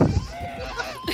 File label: rage